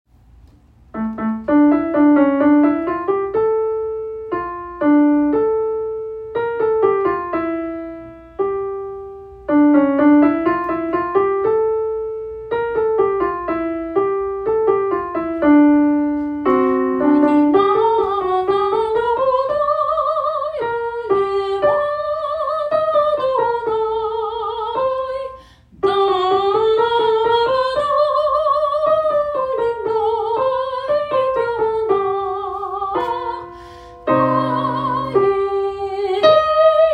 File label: Sopranos; Shirei Chesed Fall 2025 Recordings